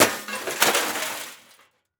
Metal Smashing Into Plastic And Glass Debris; Crashes

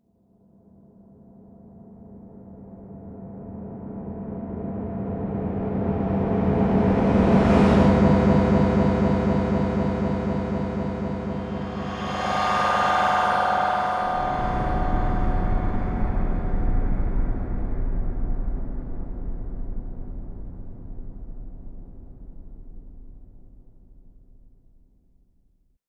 Electronic Whoosh Reverse Feedback Digital; Module Production Element Title Imaging; Imaging Elements

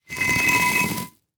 Foley Metal Drag Through Rock Salt

